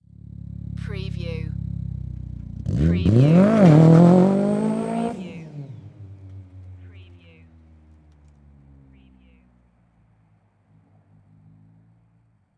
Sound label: MITSUBISHI ECLIPSE EXHAUST TAKEOFF